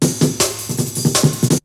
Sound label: BREAKBEATS, TECHNO